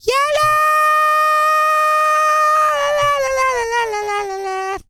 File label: REGGAE, Vocal Planet World Voices